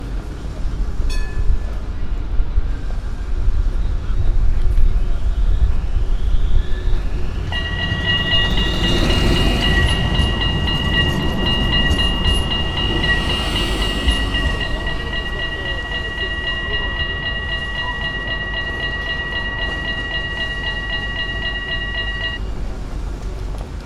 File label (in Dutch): Rotterdam Tram Overwegbel Passerende Tram Rotterdam Centraal